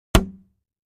Gallon Jugs Strike To, plastic

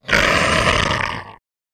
Leopard Breath Snort; big cats

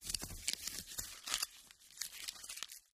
paper crumple; paper mvmt

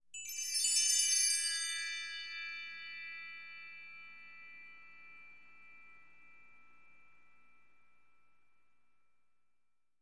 Music Trans Bell Tre, chimes

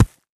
Concrete Chunk Drop; rocks & dirt

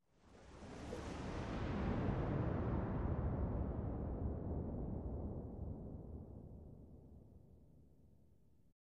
Arena Crowd Wave Whoosh; sports wave whoosh

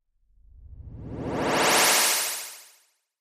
Wash HF Elect Buzz

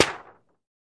Target Hit Light Pap, target range

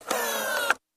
Door Outer Open, Damper, Mechanism; dat machine

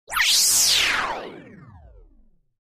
Descend High Frequency Descend with Delay

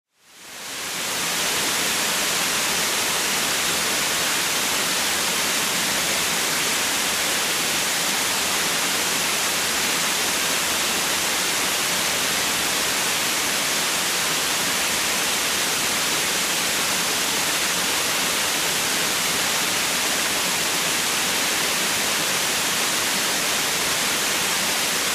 Water Fall

